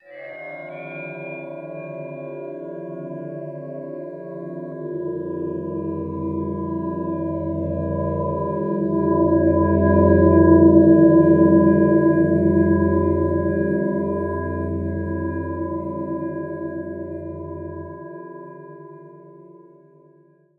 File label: GLASS DRIVE, Anime Sound FX; AKAI Anime Sound FX; FLYOBJECTS